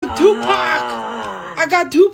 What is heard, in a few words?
ooouuuggh